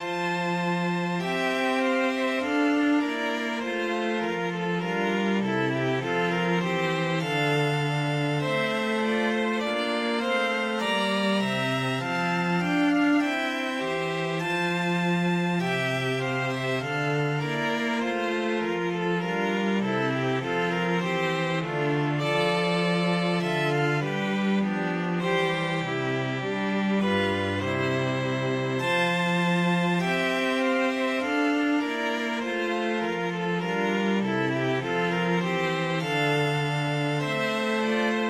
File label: Hornpipe, string quartet